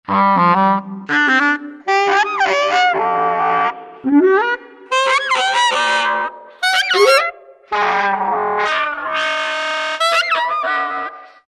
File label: Freakout Sax, Roland SR-JV80-12 Hip Hop Collection